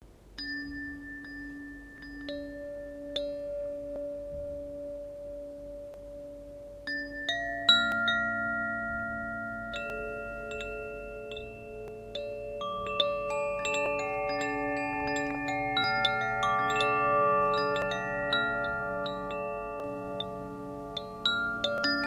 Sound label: Koshi chime Aqua